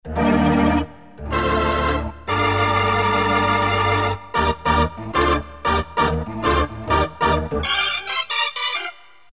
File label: Organ